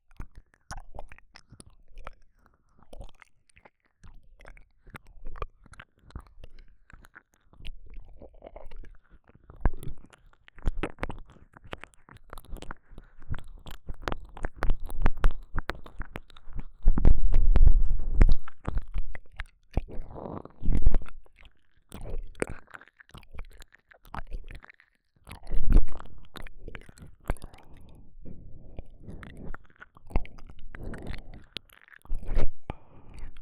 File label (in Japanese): 耳舐め 右耳+左耳+両耳